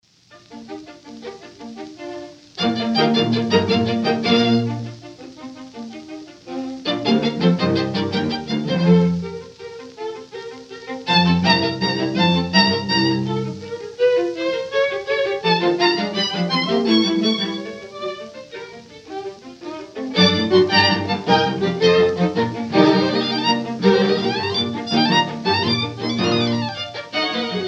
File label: string quartet